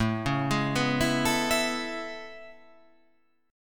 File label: A Major 9th A